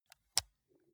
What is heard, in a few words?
console controller option press slow